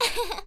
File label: Girl Laughing